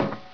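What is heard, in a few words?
metal, footsteps; hl2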